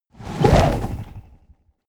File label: whoosh flutter